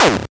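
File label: Game Boy Perc, DRUMS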